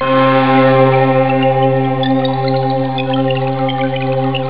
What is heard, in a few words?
string; ST-XX Amiga Soundtracker Sample Packs